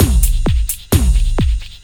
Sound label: Beat, Drums & Percussion; Electrocution; Beats